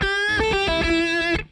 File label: DRIVER LICK, Funk Guitar; 90 s Sample CDs; Zero G